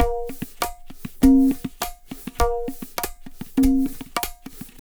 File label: Tabla, 100 BPM; Zero-G; Pure Tabla